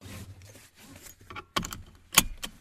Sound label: putting belt; deathmatch